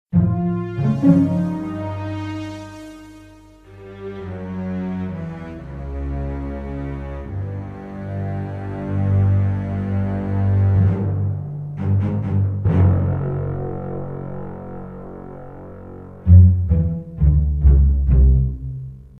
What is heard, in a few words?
Lasers; Ben 10 Protector of Earth